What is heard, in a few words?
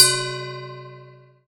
tamtam